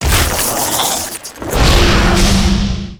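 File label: thief death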